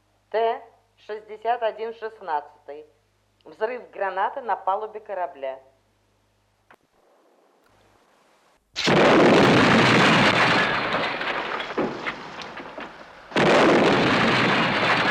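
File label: grenade explosion noise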